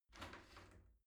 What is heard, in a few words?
shower slide door slide open soft